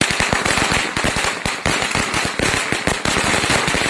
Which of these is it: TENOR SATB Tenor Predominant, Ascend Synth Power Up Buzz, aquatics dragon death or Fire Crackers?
Fire Crackers